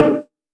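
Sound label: Low Bongo Lo Fi, Roland SH-32 Drumset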